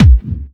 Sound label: Clubby Kicks, Bassdrums